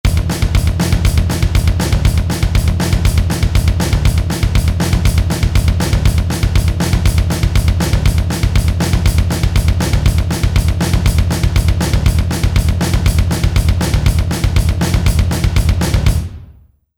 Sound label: Punk